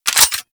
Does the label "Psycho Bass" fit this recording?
No